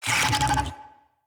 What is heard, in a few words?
Infested Big Ashen Vocal Pain